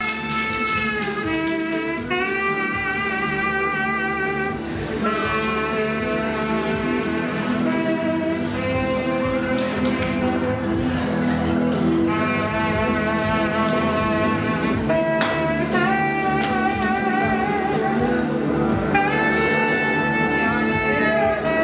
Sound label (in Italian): strumentale